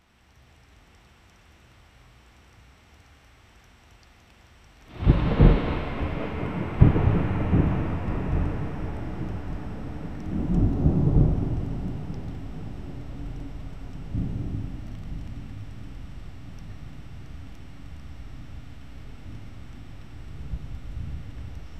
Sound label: distant summer thunder wi